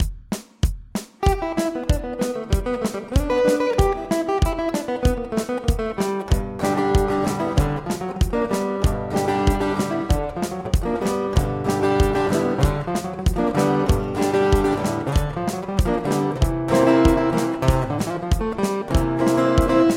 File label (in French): la country du blues rock